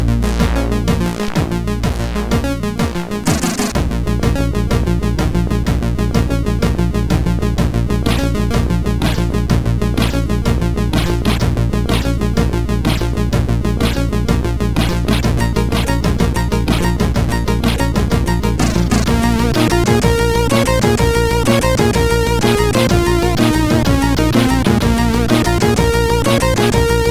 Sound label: Title, MOS6581R4 sid